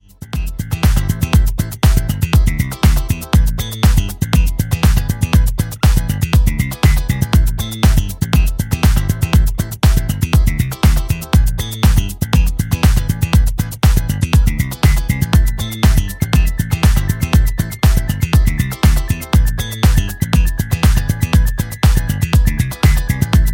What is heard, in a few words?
Retro Funk; Temptation; MIUI12